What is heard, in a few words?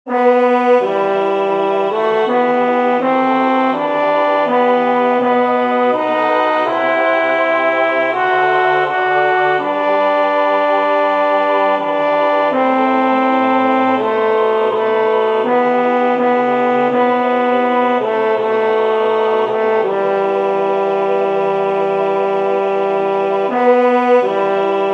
Tenor; Vaermeland